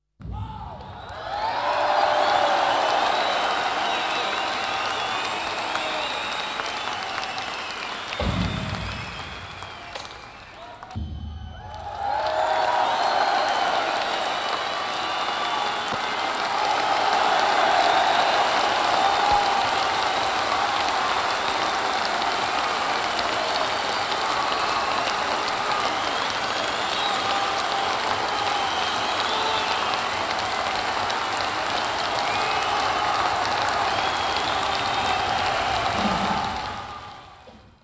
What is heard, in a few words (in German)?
Konzertstimmungen Rockkonzert Applaus Und Buehnengeraeusche